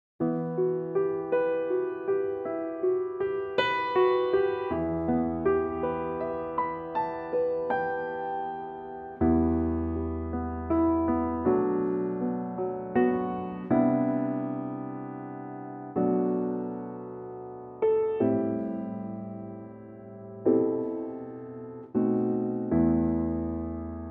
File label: Em piano; key - Em to Fm - vocal range - B to C; Wonderful 3/4 ballad; Pianotrak